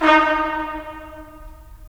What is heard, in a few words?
BRS TPS, Stacc; Roland - LA Composer vol. 4